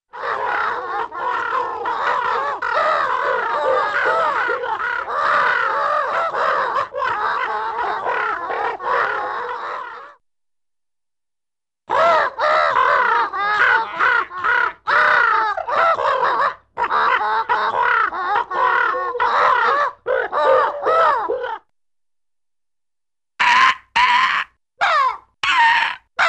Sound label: Flock Of Gannets Coo & Squawk Herons - Various Birds; Cartoon; Hollywood Edge; Cartoon Trax